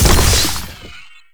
Flying Mouth Proj Hit; Enemies; Warframe; ManInTheWall

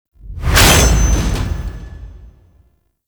Sealab Stalker Melee; Warframe; The New War

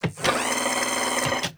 Foundry Motor Forward; Liset Machines; Tenno

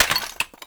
Clay Pot Destroy; Warframe; Awakening Remaster

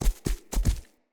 Stag Gallop Staggered Run Vegetation, Footsteps; Animals; Foley

